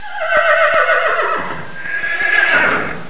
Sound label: whinny; riding for amanda